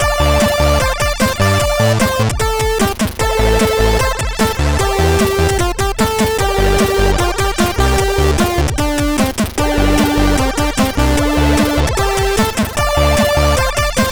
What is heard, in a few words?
Cracking Intro Tune, sid CSG8580R5